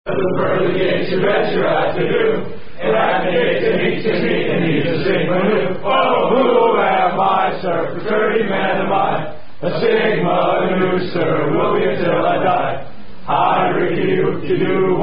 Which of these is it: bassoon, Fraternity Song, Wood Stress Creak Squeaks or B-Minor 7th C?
Fraternity Song